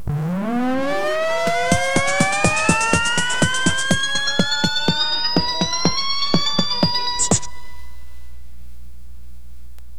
electronic

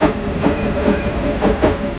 the sounds of the wheels, machinery

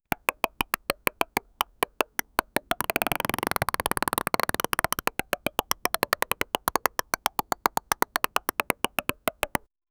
pong wall touch; atari; arcade

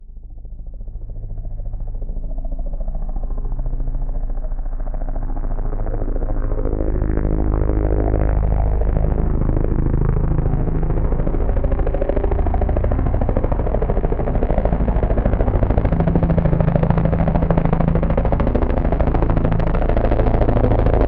helicopter cinematic

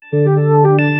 new element discovered in, new

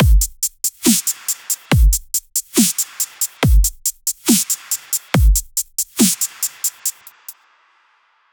dubstep; electronic music

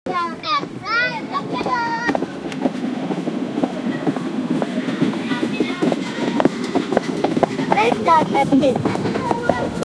children walking on the

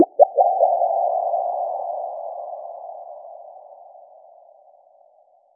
create the same spring bo, spring

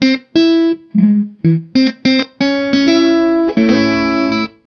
sad but epic guitar riff

